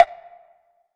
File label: woodblock F; Polyend; ARC Noise